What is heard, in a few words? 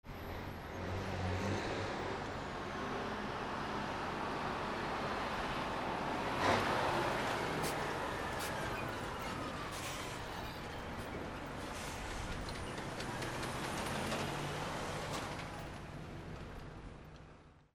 VEHICLES